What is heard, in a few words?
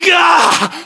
pain, english